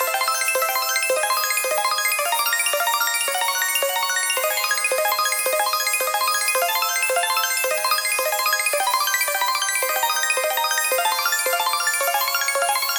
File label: cash stack reel spin; netent